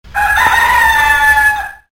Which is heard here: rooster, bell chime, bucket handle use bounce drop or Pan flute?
rooster